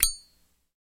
Zippo Lighter Open; lighters & matches

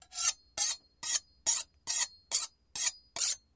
Knife Loop Sharpen, utensils

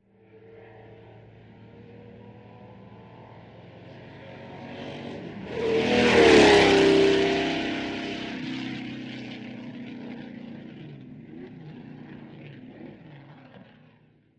Car Sports Car Long Ve

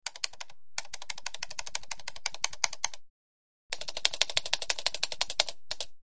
Laptop Keyboard Taps

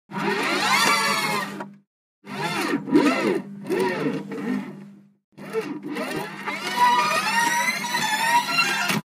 Gramophone Broken Play Short; Gramophone Broken Play Short x3; record players

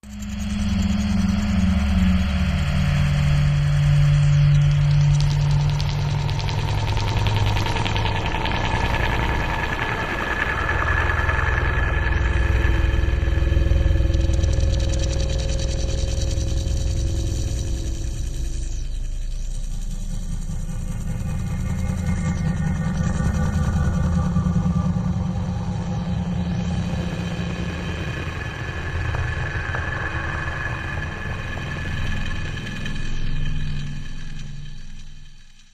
Drifted Machine, electrical

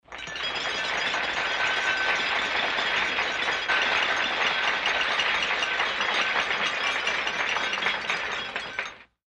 Applause Tapping Gla, party

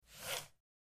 Short Mouse Slides

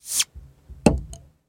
Bottled Drink Open; eating & drinking

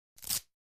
Biking Quick Velcro

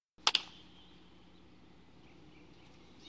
Baseball Bat Wood Me; baseball fx & games

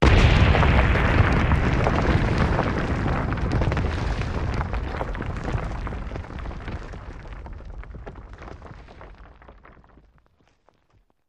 Explosion, Wood & Brick Buildings; rock & sand

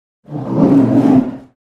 Bear, Polar Growl, Single. Medium Perspective.; bears

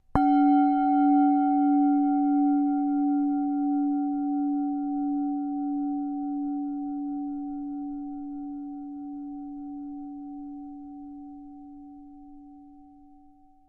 Tibetan Bell Strike, bells

